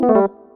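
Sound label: net charge negative; build-an-atom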